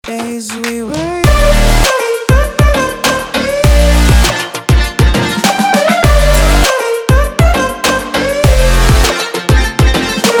Отбивка

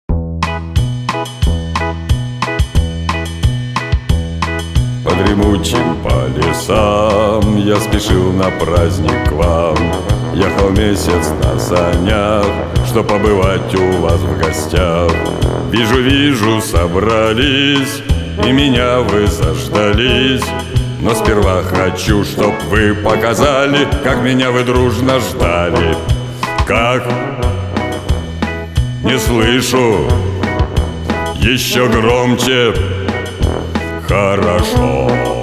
выход деда мороза

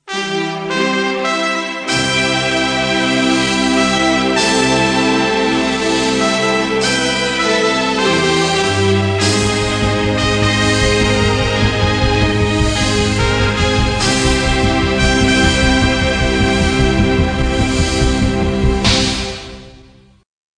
фанф начало классные

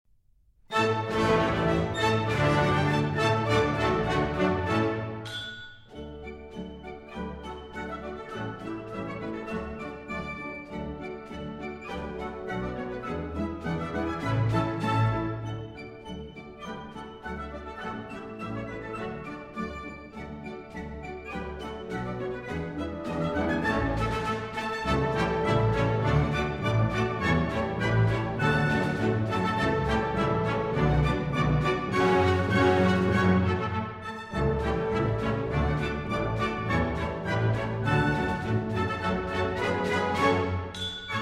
Богемская полька; Feuerfest Polka Op.269; DBF Studio

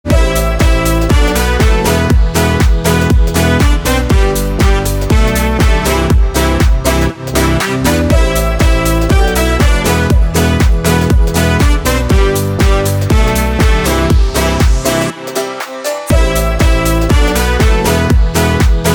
Отбивка

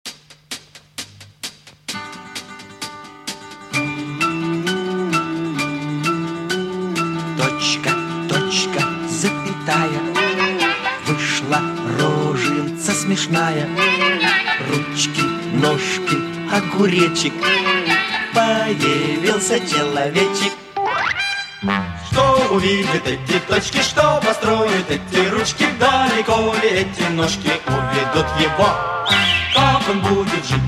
Школьные песни

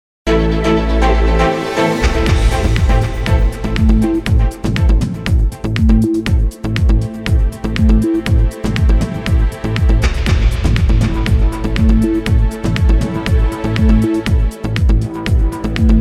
Музыкальная заставка; Вести 24

